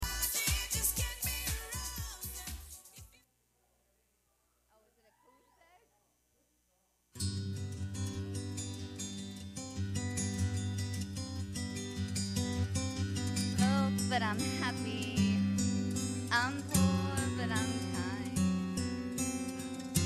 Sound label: (Acoustic Version), karaoke